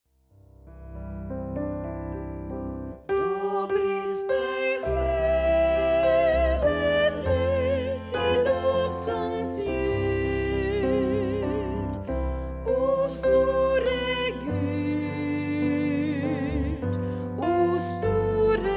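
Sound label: SATB soprano; O store Gud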